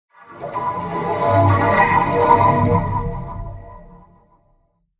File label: in Horror Pad, Vocoder